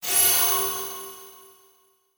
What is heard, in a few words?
Transition Metallic Blast; Production Element; Title Transition; AdobeCC